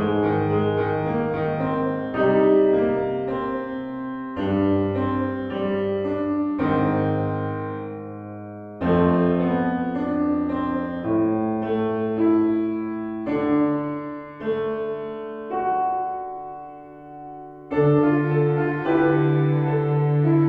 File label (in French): romantique